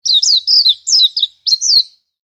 Amb Animals; Red Orchestra Ostfront 41-45

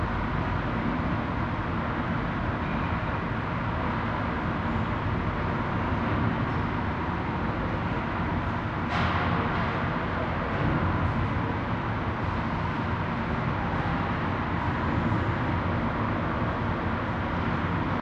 ambient port default; World Of Warships

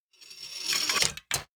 beartrap arm; Rust

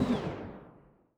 Aircrafts P-51D Cockpit Fuel Pump End; DCS

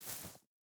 footstep burlap grass walk; Rust

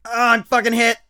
hurt medium; Escape from Tarkov

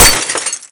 bullet glass; weapons; Modern Warfare

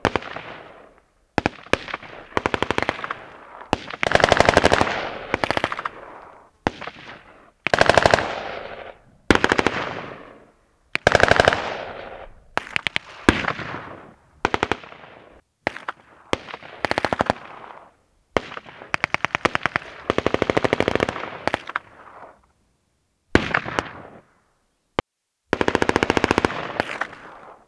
Amb Destruction; Red Orchestra 41-45

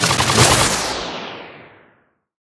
torpedo; World Of Warships; weapons

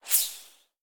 bullet passby; War Thunder

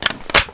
Inf Weapons Foley 3rd; Red Orchestra 41-45

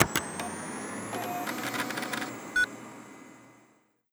autoturret turn on; Rust

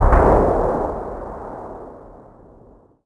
hit far; World of Tanks Blitz

